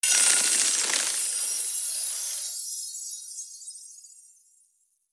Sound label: Fairy wings fluttering